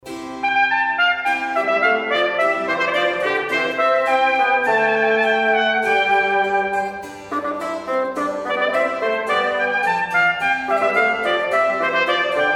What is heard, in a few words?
Tpt Bsn Harpsichord; Pezel